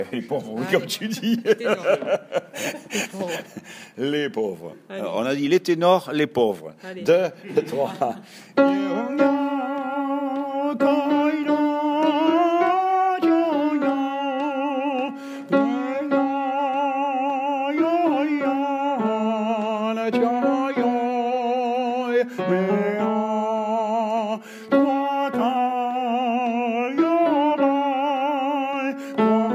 tenor suite

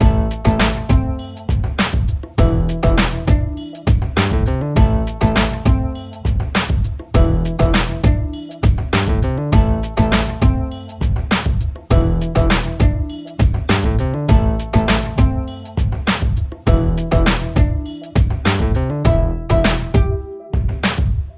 indian hip hop soul

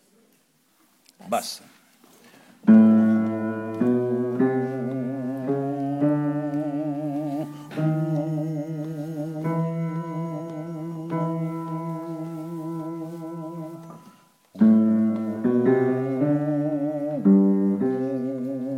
bass

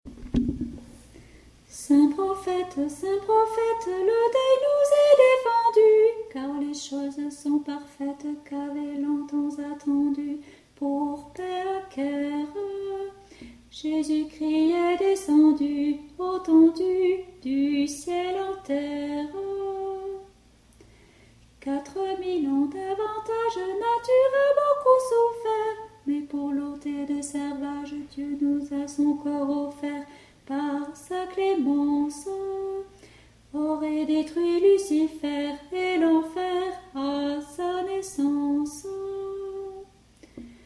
alto; sur la route des rois; saints prophetes

